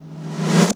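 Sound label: Reverb Tom, Boss DR660; drum machine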